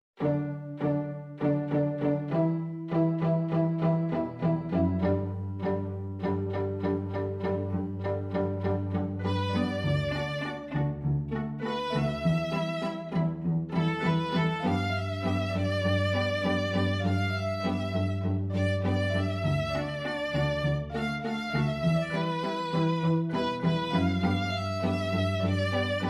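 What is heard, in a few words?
piano violin viola cello quartet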